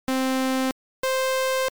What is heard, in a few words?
sawtooth